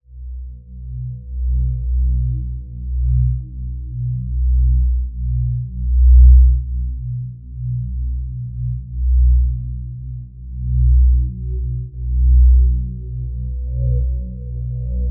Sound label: Ambient Loop; Drox Operative 2